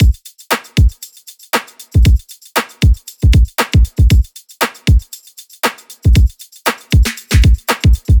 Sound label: drum loop 117; Pop; Drums; Full Drum Loops; Slate; SD PASADENA Pop Sample Pack